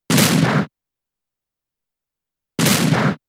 Double Kick 2 hits; pokemon sfx gen 7 attack moves sumo usum

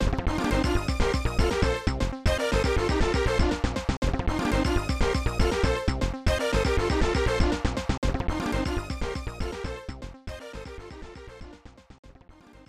Final Race Fanfare; Exhaust Heat; SNES

